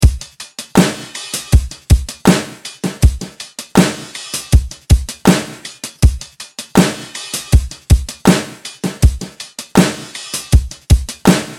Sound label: Rap Beat